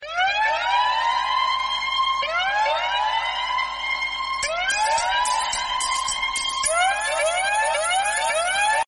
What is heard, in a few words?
alarm 3 ringtone